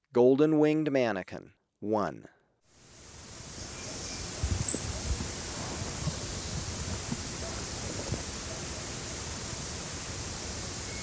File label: Golden-Winged Manakin, Birds of Ecuador; S. America